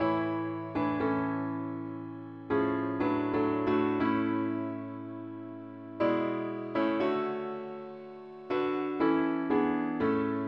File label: parts only key a